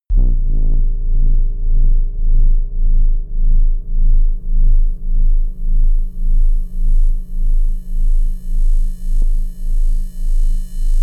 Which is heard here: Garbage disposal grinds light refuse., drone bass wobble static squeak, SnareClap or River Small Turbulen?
drone bass wobble static squeak